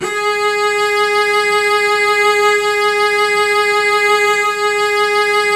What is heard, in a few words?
Virtuoso Strings; ILIO; Kirk Hunter Virtuoso Strings VOL-2; 90 s Sample CDs